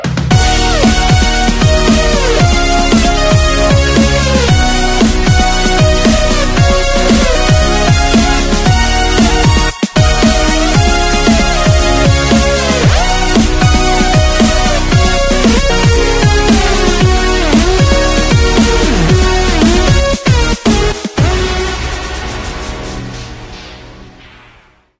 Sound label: EndLevel; dashy square